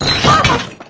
Robot Idle; Clickergame

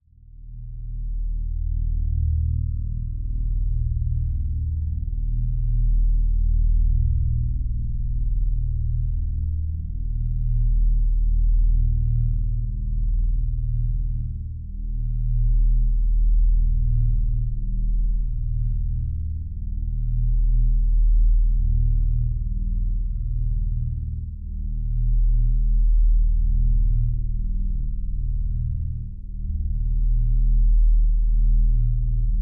Ambient; Cards of Cthulhu

